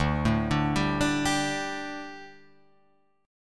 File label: D Suspended 4th D, Guitar, Open F; Dsus4 arpeggiated; JGuitar